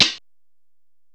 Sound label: HH CLOSE, CYMBALS; M8; CMI Factory